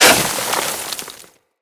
Melee Impact Snow, Weapons

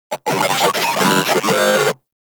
Moa Vocal Attack; Warframe

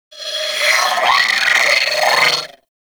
Sentient Brachiolyst Attack; Warframe

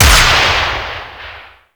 Heavy Shotgun Projectile Explode C; Warframe; Tenno

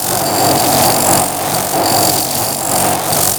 Antimatter Follow Loop; Warframe; Nineteeen Ninety Nine; Deep Archimedia

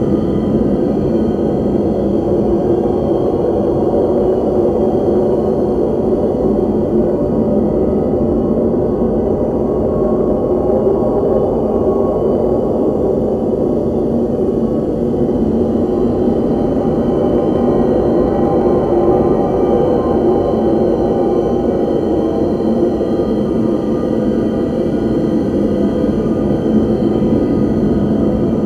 Ice Interior Open Amb; Warframe; Corpus; Ice Planet

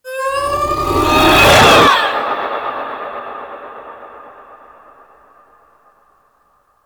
Focus Operator Cast; Warframe; Operators

